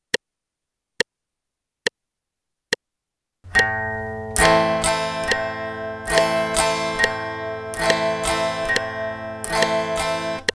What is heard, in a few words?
Am bass note, guitar